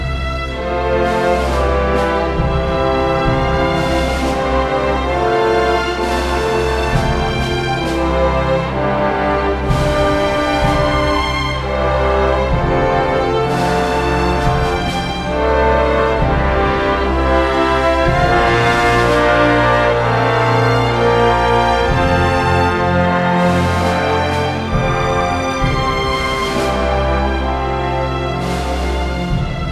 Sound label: LOADING; brothers in arms earned in blood